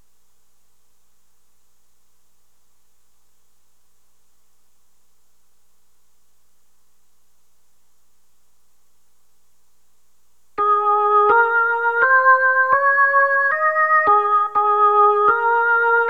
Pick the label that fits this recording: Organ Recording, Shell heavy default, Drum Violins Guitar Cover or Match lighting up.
Organ Recording